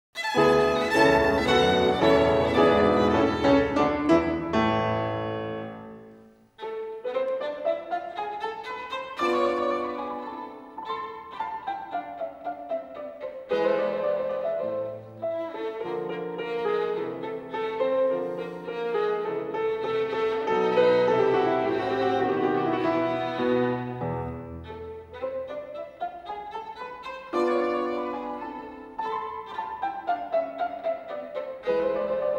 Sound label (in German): Violinsonate A-Dur - Variation V; Mozart; Chamber Music; Violin Sonatas